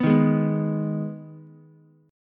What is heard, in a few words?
G sharp Minor G sharp down Ukulele, chord; G#m strummed; JGuitar